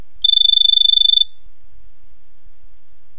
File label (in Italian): telefono